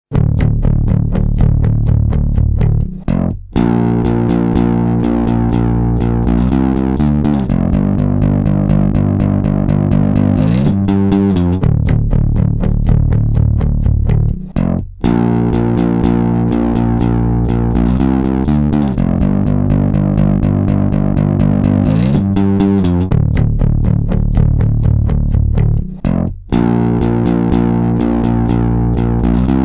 E Bass active

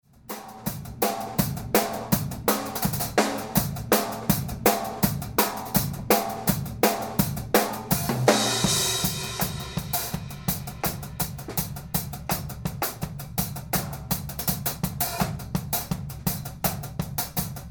Mono Drum Room

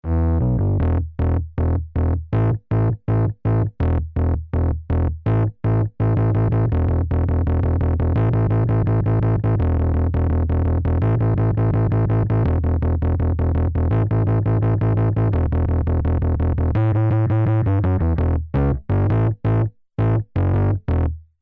karacterised Bass active

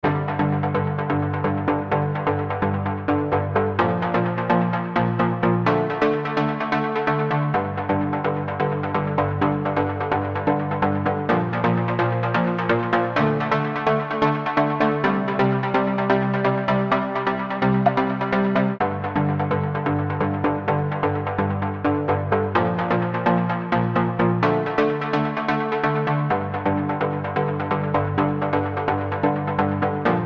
Synth Stabs bypassed; xpressor

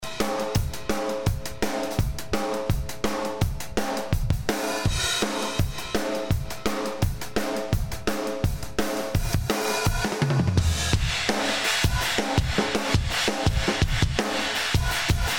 Drum Buss active; nveloped Drum Buss

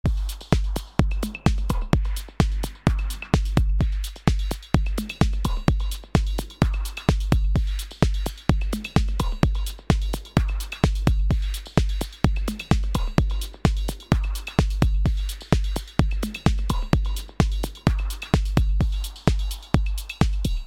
Elektro Beat

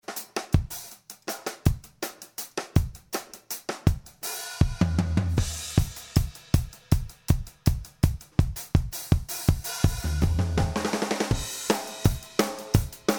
mpressored Drum Buss active

